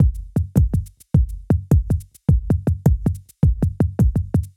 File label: 105 Loose Grooves, Percussion; Loops; Percussion (Mono); Touch Loops; ElementsOne; AbstractRhythms